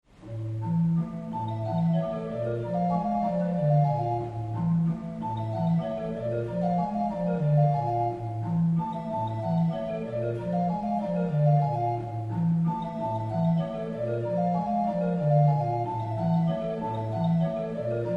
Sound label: WALTZ; Glass